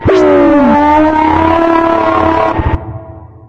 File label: dog scared, synthcrab; scmod